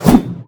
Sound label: strong, player attack; Minecraft; Votive World